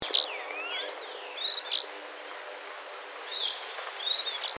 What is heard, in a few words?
mangrove swallow